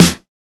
wet acoustic snare f key, steel snare drum sound tuned to the F note; snare drum samples